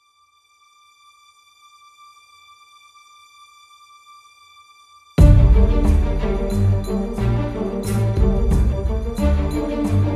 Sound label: Orchestral